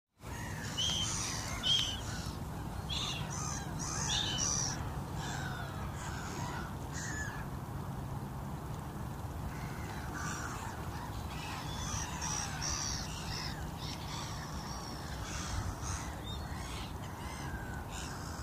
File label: field recording stamping gulls